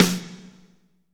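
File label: snare, Jazz; Northstar - Drumscapes Roland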